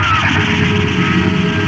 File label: TIE LASER; STARS FXS; East West; Global Sounds